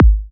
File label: Club Kick